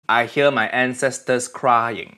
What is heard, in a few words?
cry; uncle roger; ancestor